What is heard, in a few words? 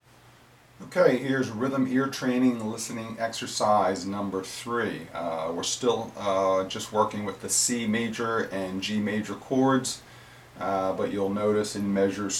Rhythm Ear Training Exercise